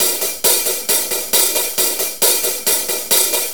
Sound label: 4-4 HiHat Loop; Drum Tools